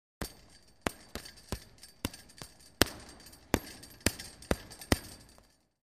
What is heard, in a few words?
Boxing Slow Punches